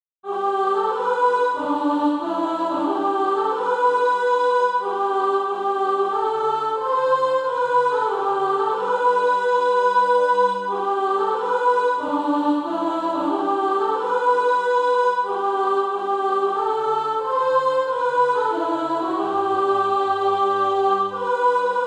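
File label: soprano, SATB Practise Music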